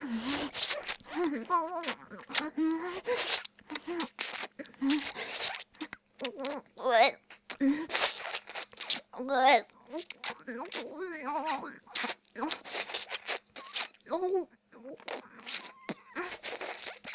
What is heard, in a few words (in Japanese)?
フェラ; レイプ